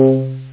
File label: bounce; Lotus; Beanmach; rollover